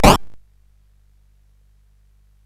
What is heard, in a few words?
sid CSG8580R5; Gremlins 2-The New Batch